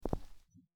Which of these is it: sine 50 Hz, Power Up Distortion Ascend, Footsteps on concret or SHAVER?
Footsteps on concret